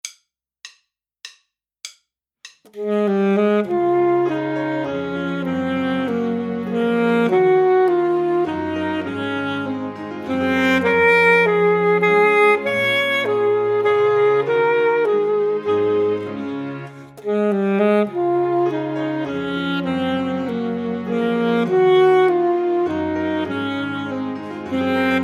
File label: Waltz Full Sax